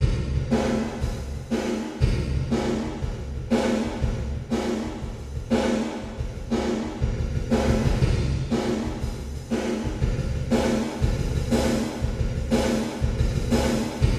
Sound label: drum In Hall